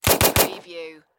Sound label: Submachine Gun Thompson